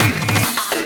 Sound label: Fill 140 BPM